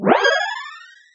pickup orb; hyperrogue

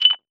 pickup potion; hyperrogue

